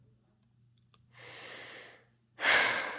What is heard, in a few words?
exhale soft